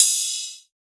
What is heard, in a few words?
cymbal choked; Drum One Shots; SD MIDNIGHT Sample Pack; Slate; Cymbals